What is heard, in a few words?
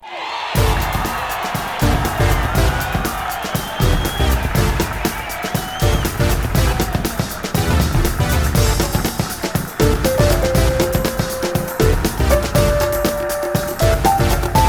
Victory; Need for Speed 5 Porsche Unleashed; Cypher